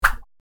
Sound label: swish; attack